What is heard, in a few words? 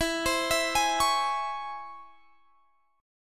C sharp Minor Major 7th, chord